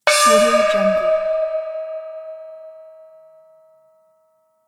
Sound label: chinese opera gong